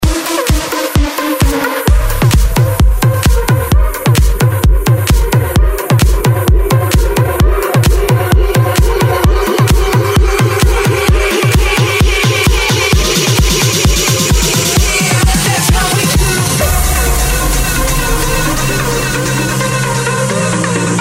electro house

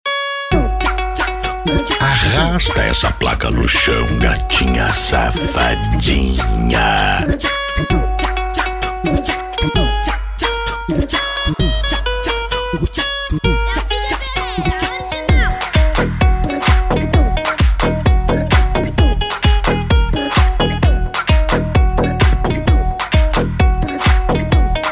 funk

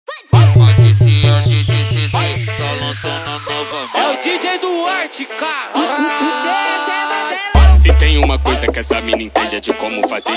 mega bass

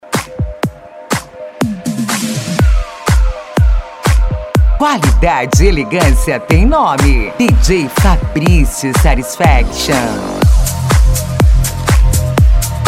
deep house

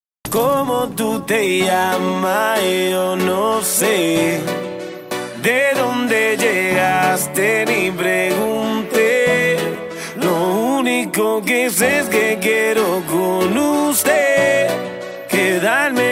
reggaeton mix; reggaeton mix evolution

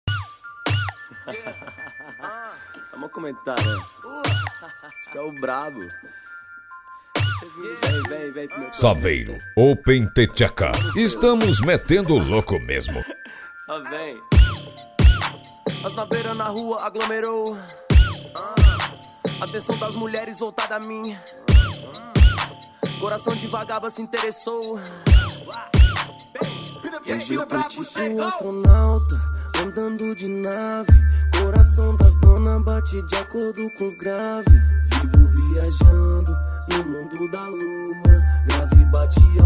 bass; saveiro open the theca

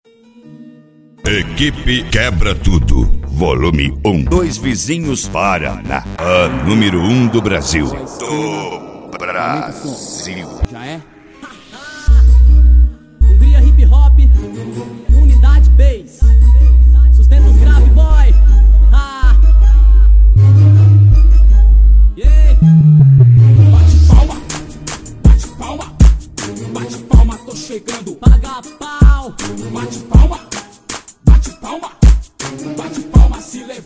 rap bass

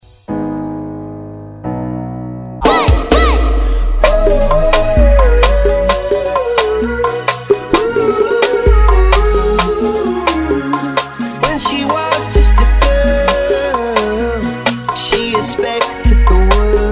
sound car reggaeton; cd volks sound car

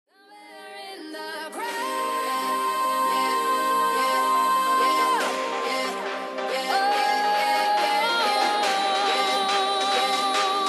mega bass

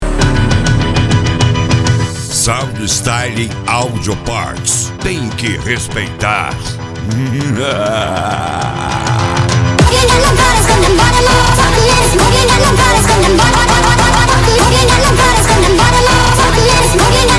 sound style dubstep

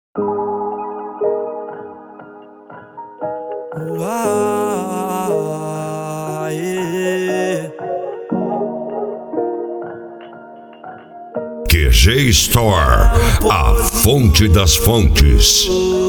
trap

